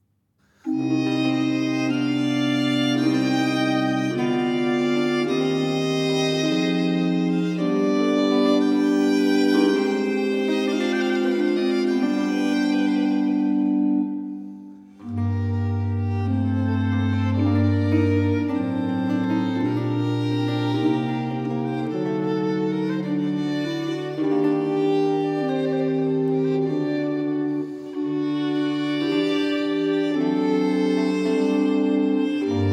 Sonate 11 in D minor -1. Grave; Archangelo Corelli; Arcangelo Corelli; Corelli - Complete Works; Klassika

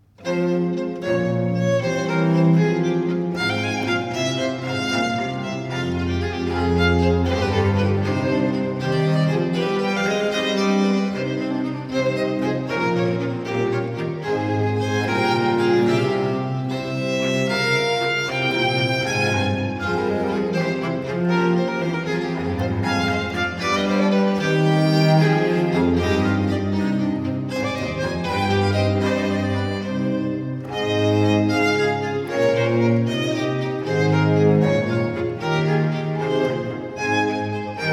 Sonate a Tre in G minor 4. Allegro; Arcangelo Corelli; Corelli - Complete Works; Klassika

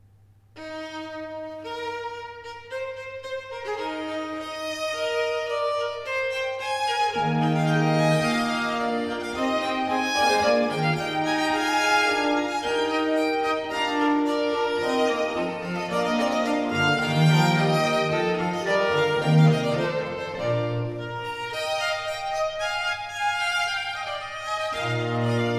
Sonate 1 in F major - 2. Allegro; Arcangelo Corelli; Corelli - Complete Works; Klassika

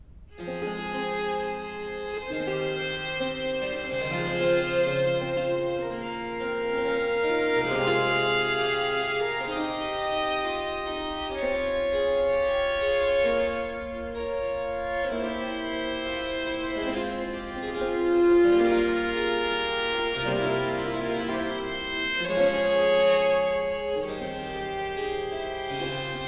Sonate 9 in B flat major Grave; 3. Grave; Arcangelo Corelli; Corelli - Complete Works; Klassika

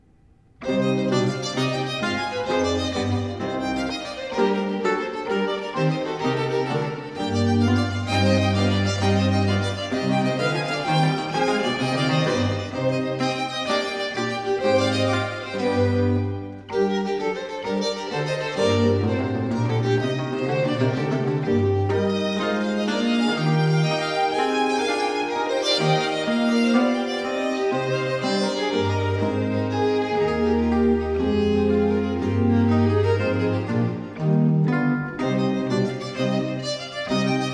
Sonate 5 in D minor - 2. Allegro; Arcangelo Corelli; Corelli - Complete Works; Klassika

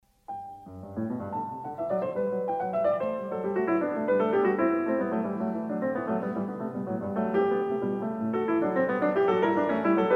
Bach Goldberg Variations; Klassika; Bach, Johann Sebastian

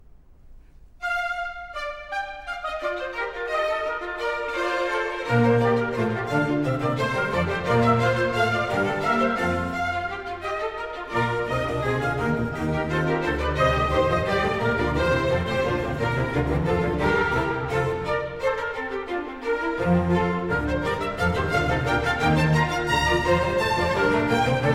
Concerto Grosso in B flat major; Handel Masterworks; Klassika

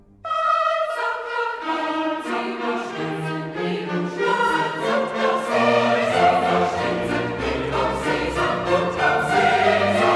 Choir of the Jews; Baal sank dahin; Handel Masterworks; Klassika

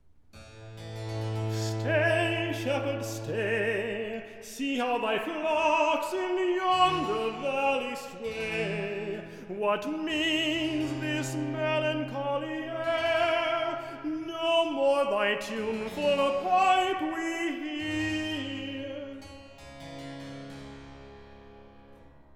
Recitative; Stay, shepherd, stay; Handel Masterworks

